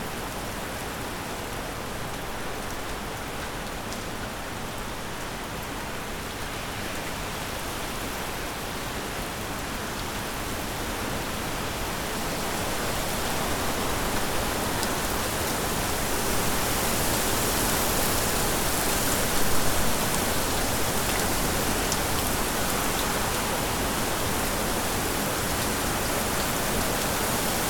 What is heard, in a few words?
rainfall band